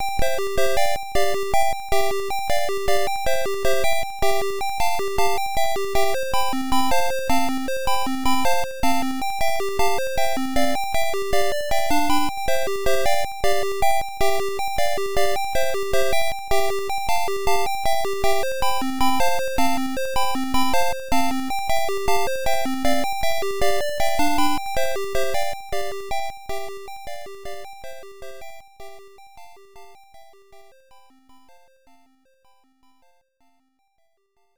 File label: Main Music; lot lot; arcade